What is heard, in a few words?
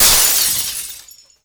Barrier Break